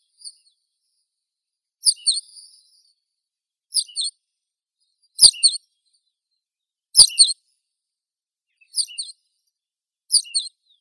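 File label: tawny pipit, Anthus campestris; konek polevoi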